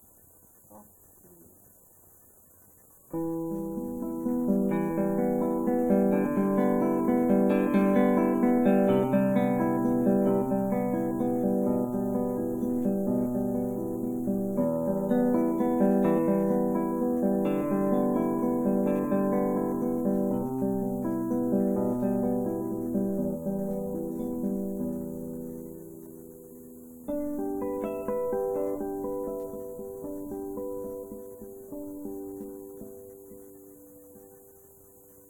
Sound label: Soft Breeze, Guitar; Scenic Music for Guitar Beginners